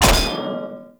Shield Impact Player Flesh; Weapon Impacts; Enemy; Shields; Sinecure Shield

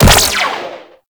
Flak Ship Rifle Fire 3D A; Crp Flak Ship Rifle

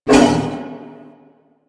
Wolf Attack Short; Duviri; Animals

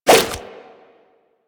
Nunchuck End Whoosh; Warframe; Infested

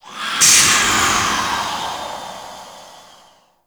Stream Blast D; Warframe; Ambience; Grineer; Grn Hanger

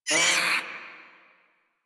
Feral Vocal Pain; Warframe; Catbrow

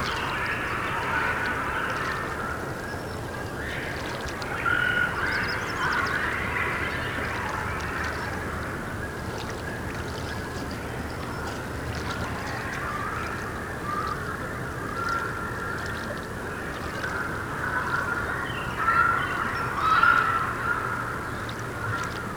Swamp Amb Loop; Ambience; Midrath Island

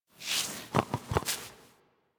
Foley Idle Alert Chew Foot; Enemies; Kubrow; Warframe

